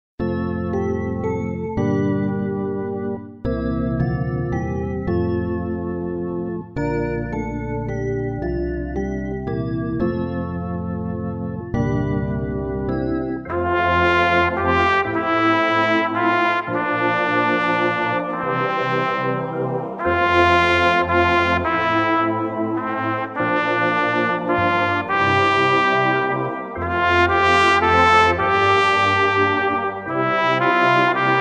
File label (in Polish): 1 trąbka; gdy klecze przed toba